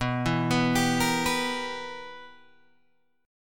B Major 7th Suspended 4th Sharp 5th Guitar Open G, chord